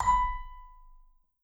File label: V SHRT MF B4, Marimba & Vibes; Sampleheads; Dave Samuels